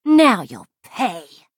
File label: attack; kvatch patroller